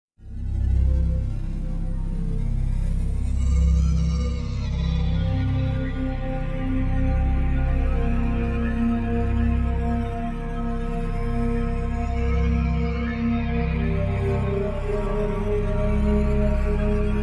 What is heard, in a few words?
music intro